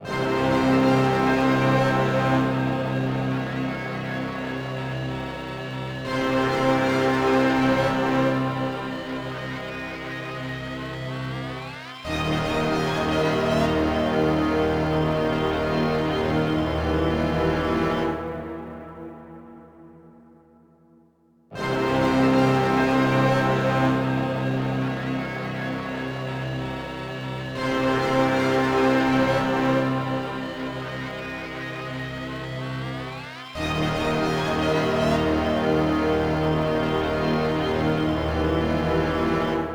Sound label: Second Dramatic Moment Before Floor; dying light gamerip 2015